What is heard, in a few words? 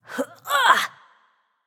grunt jump; smite; babayaga